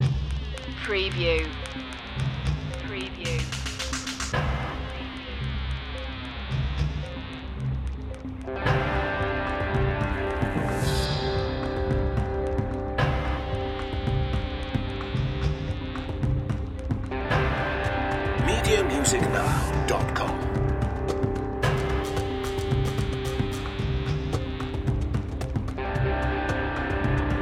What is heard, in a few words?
no keys synth strings